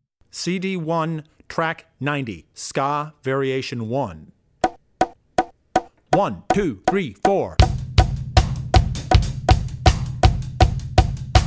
Ska; CD1 Track90 Ska (Variation 1); Drummer's Bible Tracks

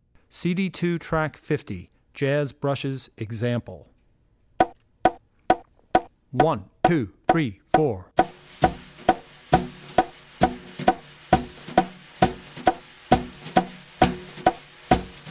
Jazz - Jazz Brushes; Drummer's Bible Tracks

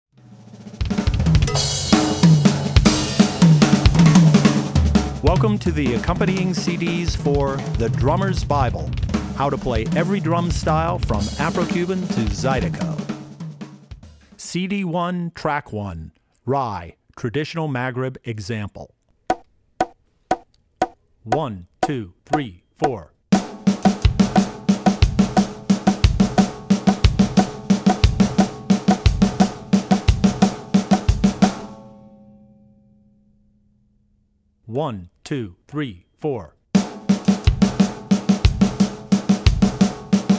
Rai Traditional Maghreb, Drum Tracks; Drummer's Bible Tracks

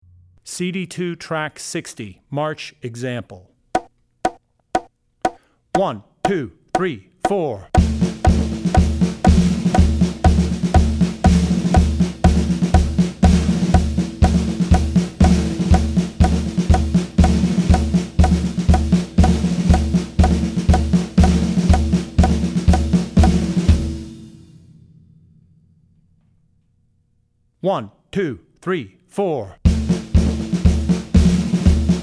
March; Drummer's Bible Tracks

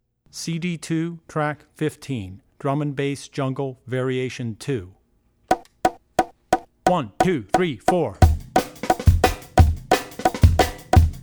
Drum & Bass Jungle; Jungle - Drum & Bass (Variation 2); Drummer's Bible Tracks

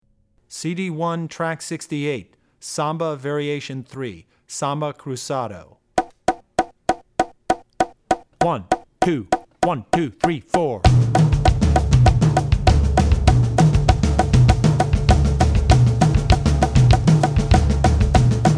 Samba (Cruzado); Drummer's Bible Tracks

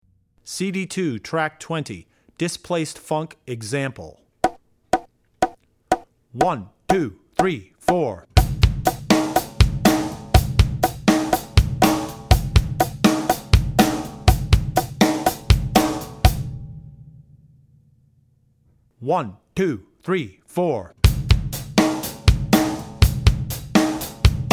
Funk - Displaced Funk; Drummer's Bible Tracks

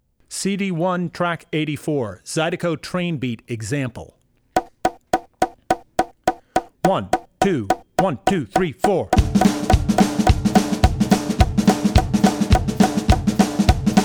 Zydeco Train Beat; Drummer's Bible Tracks

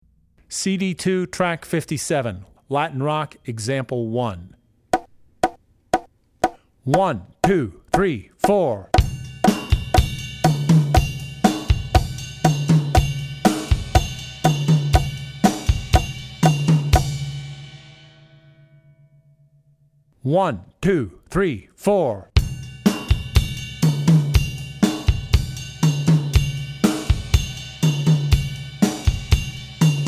Latin Rock; CD2 Track57 Latin Rock (Example 1); Drummer's Bible Tracks

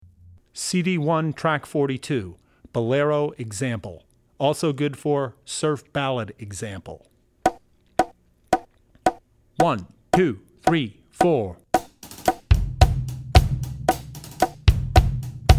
Bolero; Drummer's Bible Tracks

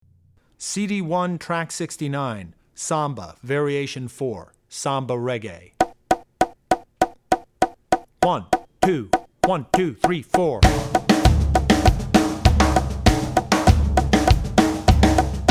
Samba (Reggae); Drummer's Bible Tracks

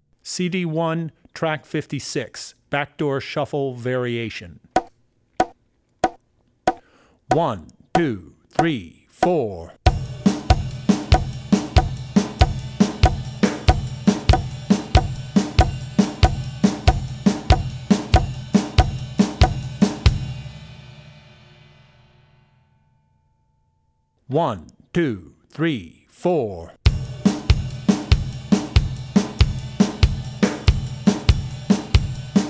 Backdoor Shuffle; Backdoor Shuffle (Variation 1); Drummer's Bible Tracks

